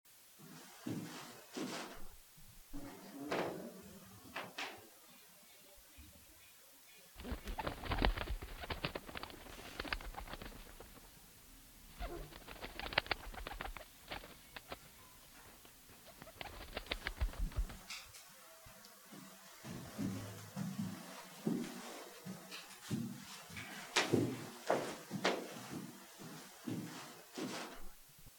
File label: foley; MusIcons